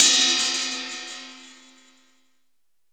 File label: Roland R-8 plate